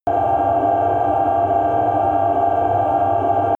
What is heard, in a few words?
underwater noise